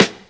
thick snare drum g sharp key, steel snare drum sound tuned to the G# note; snare drum one shots